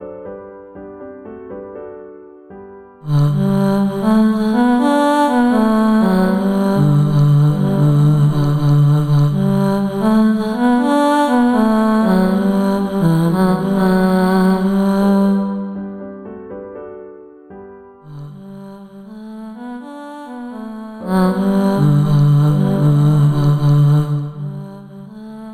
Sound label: Three Ships bass, ttbb